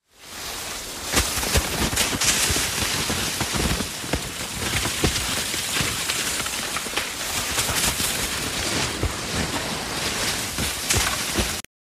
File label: Avalanche